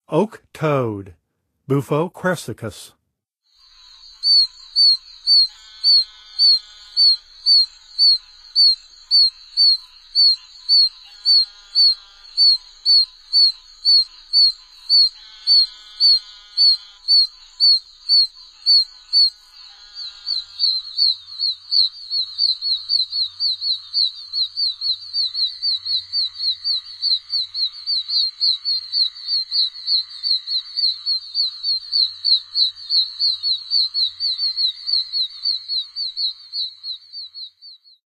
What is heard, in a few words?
Oak Toad; North America; Songs of Frogs & Toads of North America